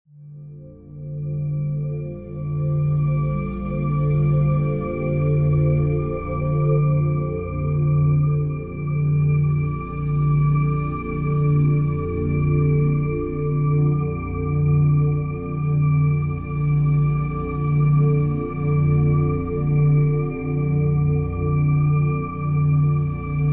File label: Asian Bells 384 Hz